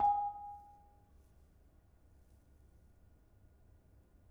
Bonang Panerus, gamelan

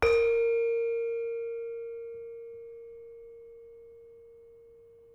Saron hard, gamelan; gravityharps; timbrebox

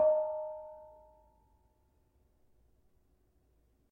Barung 5 D Sharp, gamelan tuned; gravityharps

